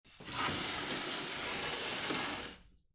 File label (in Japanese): 襖扉を開ける音; door